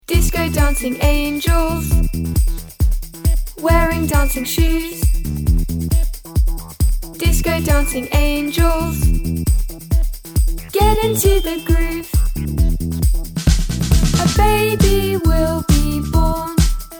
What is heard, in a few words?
Disco; Dancing Angels